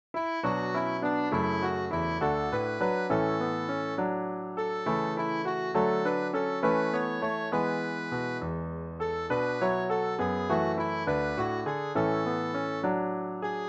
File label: o holy spirit come piano